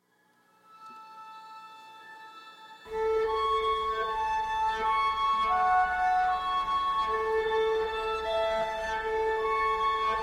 Harmonic Loops; Playground Swings

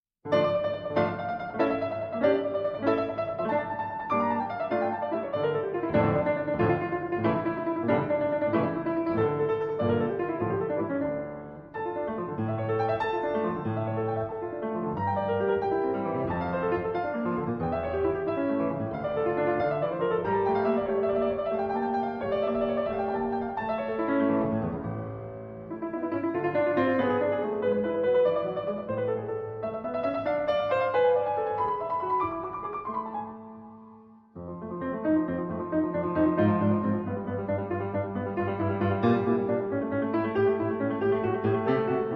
Keyboard Sonata in D Minor; D. Scarlatti

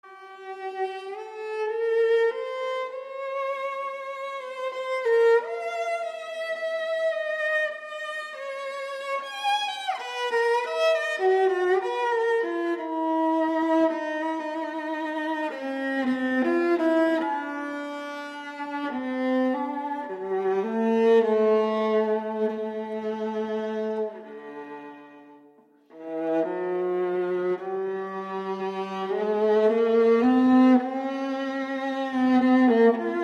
Suite For Unaccompanied Viola; III. Sarabande

